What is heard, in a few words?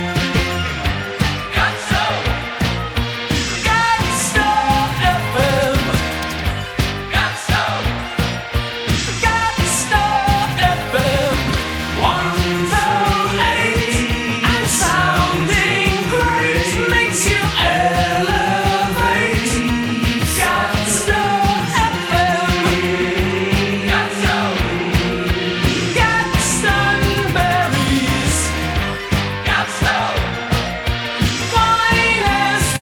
JINGLE